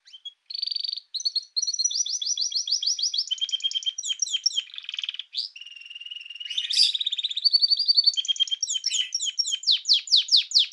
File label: serinus canaria domesticus singing; Sonniss GDC